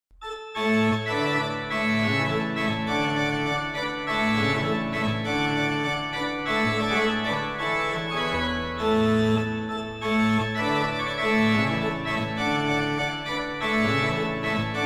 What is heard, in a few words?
Noel Tema, organ; Balbastre; callido